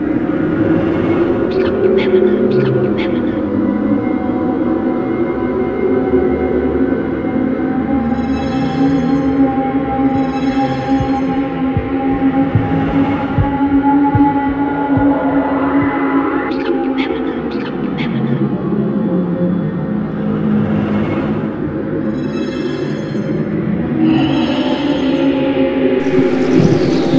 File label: Deadend Ambient; Gore Ultimate Soldier; Windows